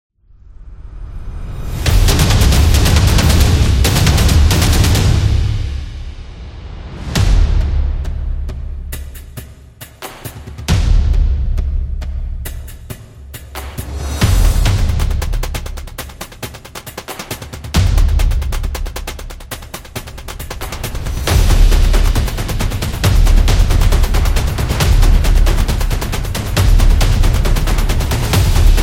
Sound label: Drums Epic; battle drums